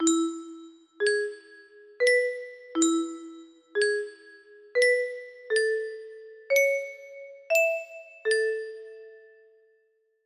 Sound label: melody, music box